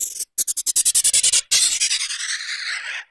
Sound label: LOOPS